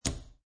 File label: Poker Chips Place One Chip Onto Stack Of Chips Wood Table; Gambling